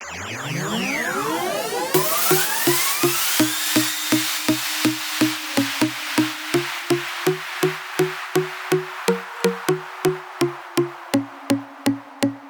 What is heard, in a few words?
Instrumental; Pony Music Archive